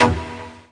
Synth Shots; Vengeance Samples

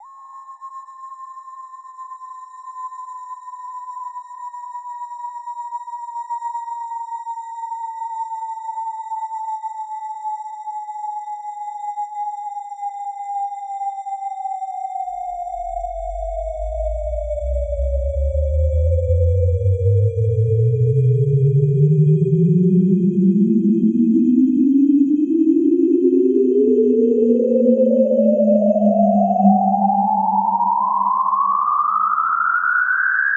128BPM Spooky; FX Fills; Vengeance Samples

